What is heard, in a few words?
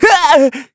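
Vox Damage, Korean; Evan; kingsraid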